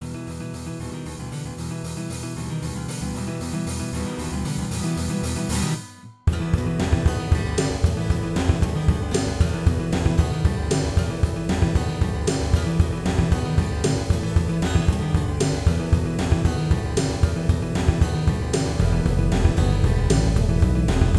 Song Cafe Cutscene Music; Octodad Dadliest Catch

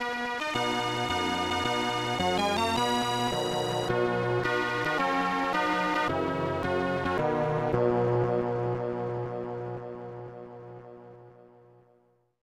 National Anthem of Mexico; Nigel Mansell's World Championship Racing; SNES

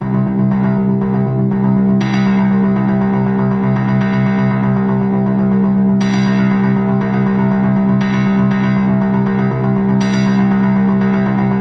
Dead suspense piano; dead man's hand; windows; xbox

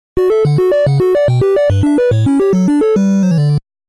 Dice Roll; Daikaijyu Deburasu; NES

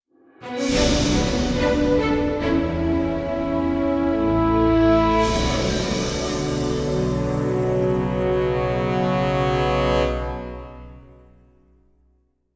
Mission Failed; Ace Combat 6

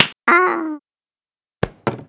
Knockout; talking tom cat 2 android ios online gamerip 2011

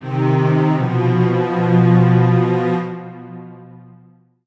Gameover; Dillon's Dead-Heat Breakers; Nintendo 3DS

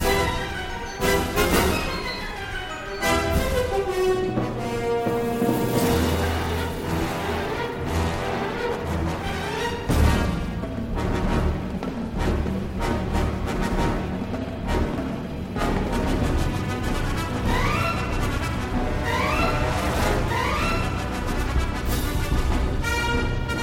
Droid Factory Action; lego star wars

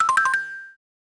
Special Chime; miracle girls 1993 snes

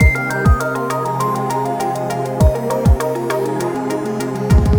Stage back Intro; invercity

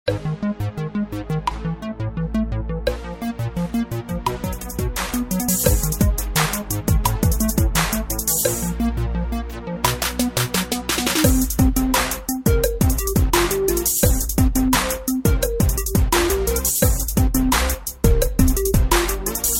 In Game Music (20 Second Countdown); Kahoot

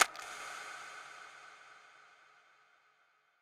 Music; Wug Music Hideout3

